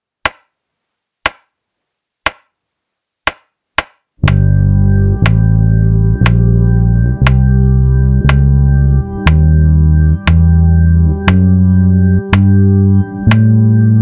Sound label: Sym dim WH, bass; a bassists guide to modes